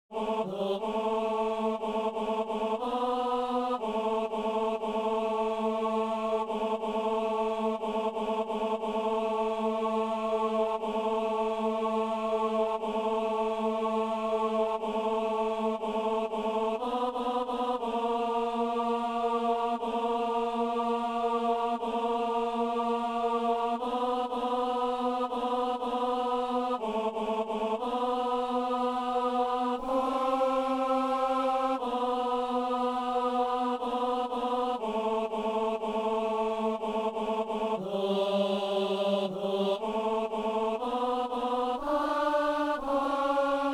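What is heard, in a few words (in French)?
ténor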